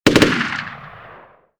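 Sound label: colt m16 exterior shots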